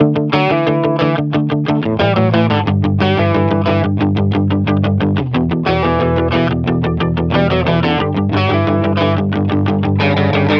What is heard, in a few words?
Full 180 BPM D; Lunchtime